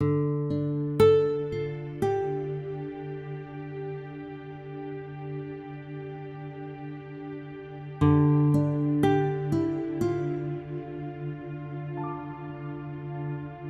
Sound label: Slow 60BPM, Cinematic Trailer Music; BlueFX